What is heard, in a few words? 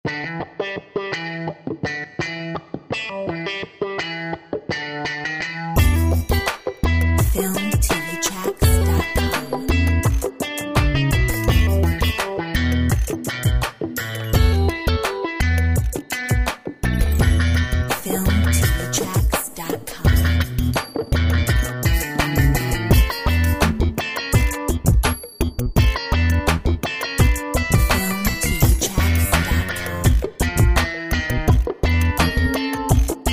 Cool funk